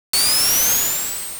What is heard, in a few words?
Roll; Sonic WindowsZone